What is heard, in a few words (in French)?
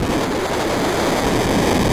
Vent